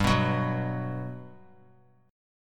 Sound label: G Major 7th, G, down; strummed; JGuitar